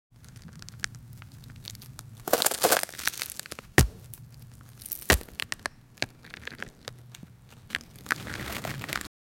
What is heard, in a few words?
a boot crushing a insect; gore